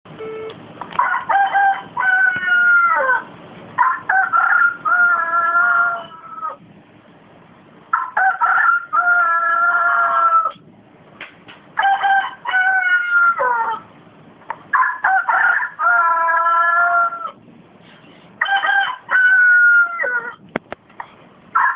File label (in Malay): ayam bkokok